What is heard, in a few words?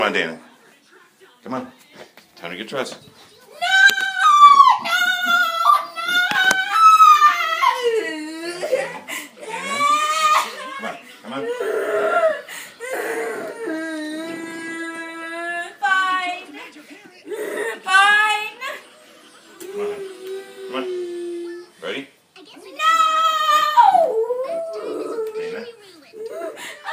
screaming, Sounds of Autism